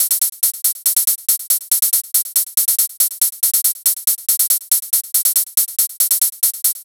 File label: 140 House Closed Hi Hat; Dance DJ; Drums; Hats; Pro Tools Essential Loops; Digidesign